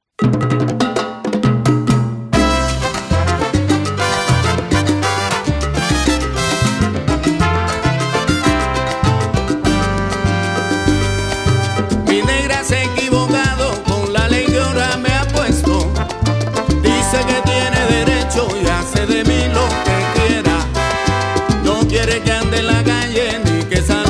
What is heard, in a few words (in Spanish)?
Salsa